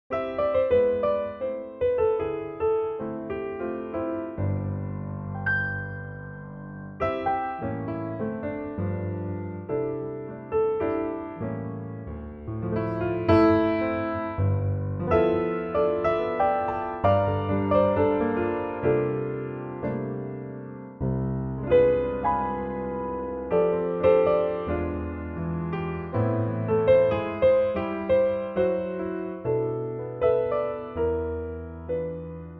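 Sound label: orchestral C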